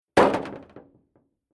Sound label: Impact Light Thin Hollow Metal On Ground Single Hit Long Rattle Stinger